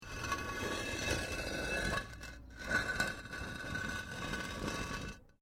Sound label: Brick Medium Hollow Move; Material components for constructing more complex sounds